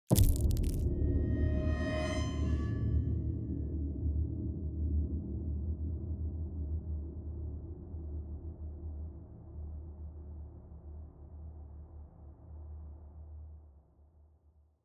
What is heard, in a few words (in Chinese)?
纸人出现